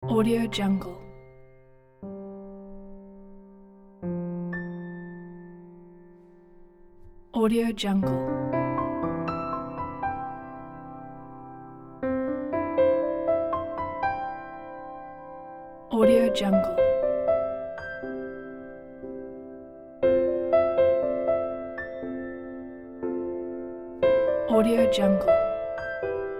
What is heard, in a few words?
emotional piano